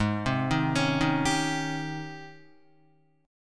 G sharp Major 11th G sharp, down, Guitar; JGuitar

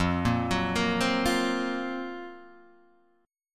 F sharp Major 7th Flat 5th F

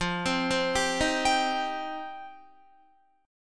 F 7th Suspended 2nd Guitar, chord; F7sus2; arpeggiated; 12,15,12,12,15,15

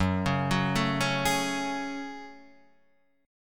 F sharp Minor Add 9th F sharp; arpeggiated

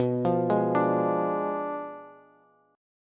B Diminished 7th B Bass; Bdim7 arpeggiated; 19,20,18,19; JGuitar

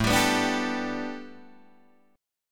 A 7th Suspended 2nd Suspended 4th Guitar DADGAD

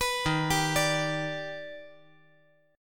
D sharp Augmented Major 7th D sharp, chord; arpeggiated; JGuitar

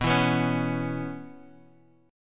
C sharp Minor 7th, chord

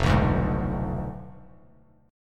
F Diminished 7th F down; Fdim7 strummed; JGuitar

